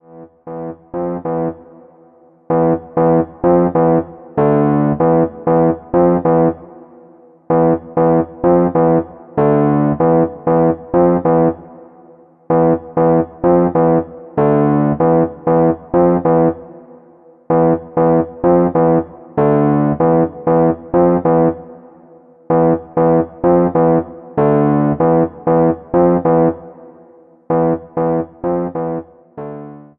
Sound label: Rhodes chord, hip hop chords; rhodes chord 10